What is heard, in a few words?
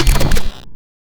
Glitch Sound